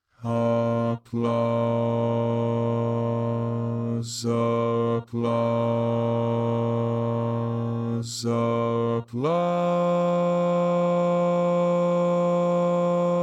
Bass, barbershop

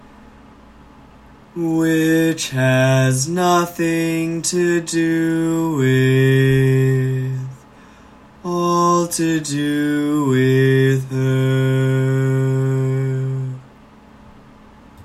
Bass

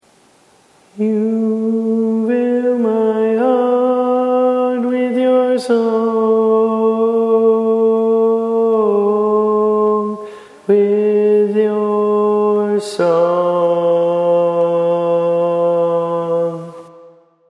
Lead, barbershop

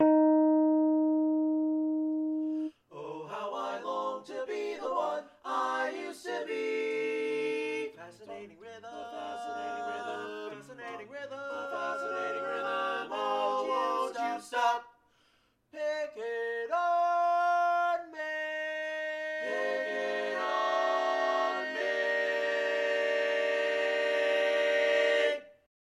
Bass, barbershop; Learning tracks

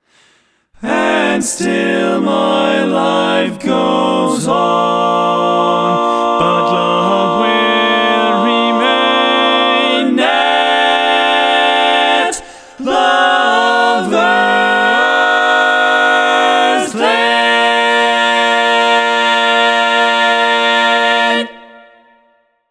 Lover s Lane, All Parts, barbershop tags

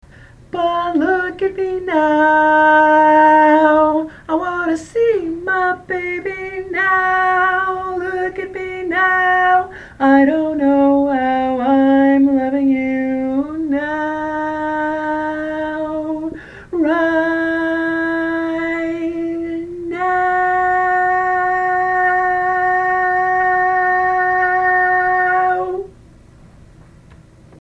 Lead, barbershop tags

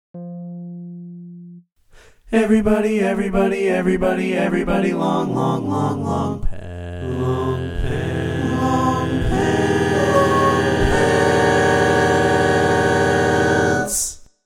All Parts, Barbershop Tags

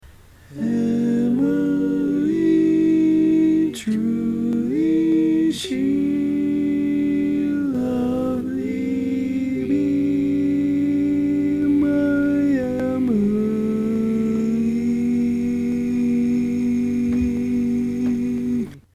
Tenor, barbershop tags

